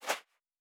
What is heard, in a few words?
Footstep Sand Walking